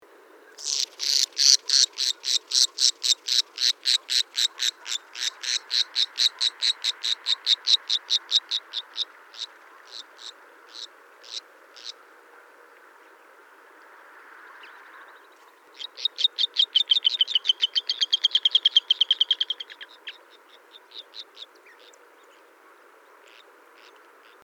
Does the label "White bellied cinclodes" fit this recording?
Yes